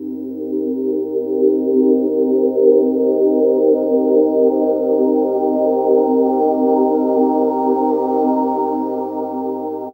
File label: Pad; Dream Zone